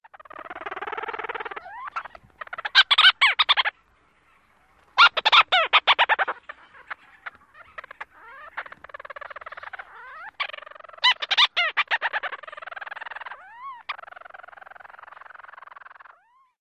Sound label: purrs and chatter calls